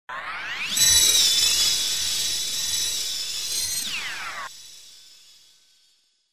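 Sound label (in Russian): синтезатор эффект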